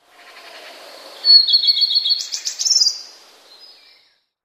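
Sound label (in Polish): pleszka, jedną piosenkę pleszki, której końcówka zawiera naśladownictwo fragmentu piosenki świstunki; wroblowe; zapozyczenia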